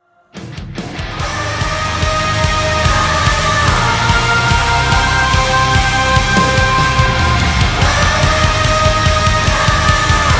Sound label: Radio Rock